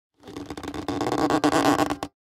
Violin Grip Tight, Violin Grip Tight Creak Musical; Strings; Blastwave; Sound Effects:Musical